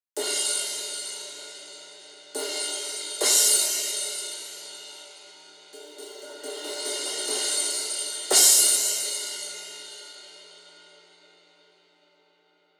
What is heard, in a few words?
Crash 16 Rock